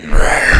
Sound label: pain; Alien Arena; xenoid